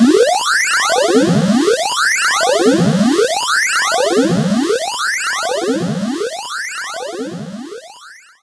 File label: Siren; SFX - Battle - Renegade Roundup Siren